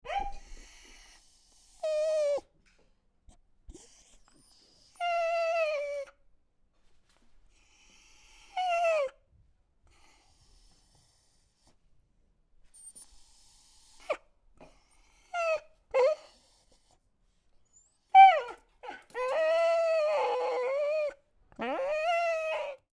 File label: dog cry; spoteffects